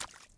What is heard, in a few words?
puddle, footsteps